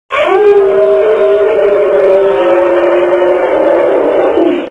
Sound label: scream low; luigi